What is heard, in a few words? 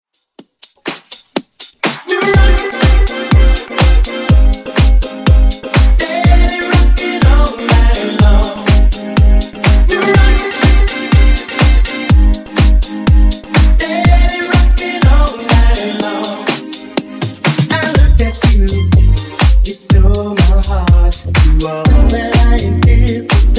Funk Redrum 123 Bpm